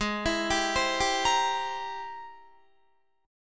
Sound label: Ab Augmented Major 9th Ab Guitar; arpeggiated; Ab+M9; JGuitar